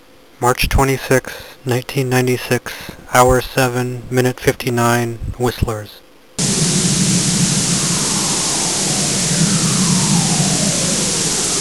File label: whistle, plasma wave